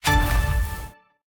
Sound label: big Win Mega Transition; fruitshopmegaways